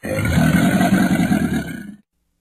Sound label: sea crocodile angry